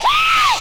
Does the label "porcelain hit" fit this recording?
No